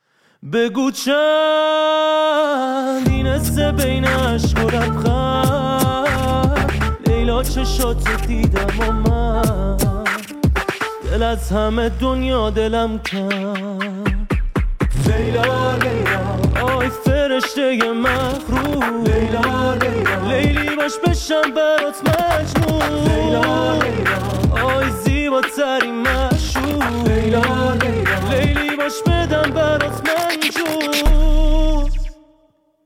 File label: Beatbox